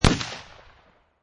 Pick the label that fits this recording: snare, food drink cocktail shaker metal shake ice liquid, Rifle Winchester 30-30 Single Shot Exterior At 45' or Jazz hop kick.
Rifle Winchester 30-30 Single Shot Exterior At 45'